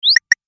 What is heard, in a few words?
Alert